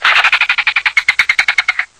ferret; animals; mammals; stamps

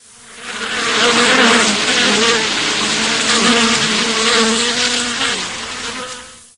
bee, insects; stamps; animals

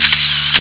camera 35mm; stamps

